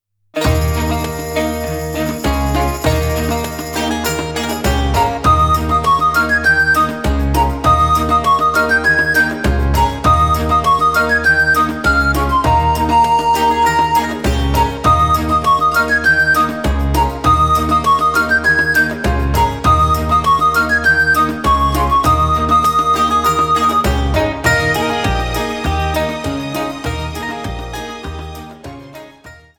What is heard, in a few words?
Constant Bustle